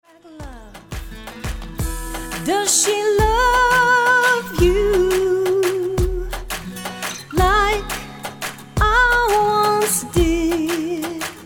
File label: Pop